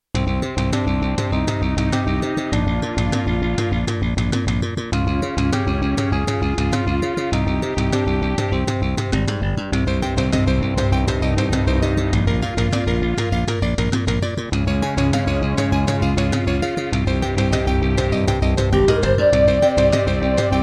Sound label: Roland SC-55mkII; Hocus Pocus